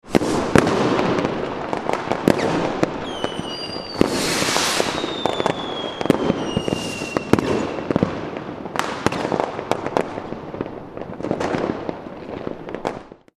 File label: fireworks